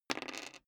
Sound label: dice roll table soft